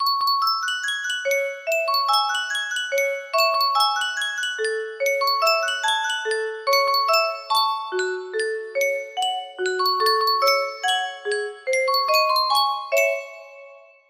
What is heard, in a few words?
Yunsheng custom tune music box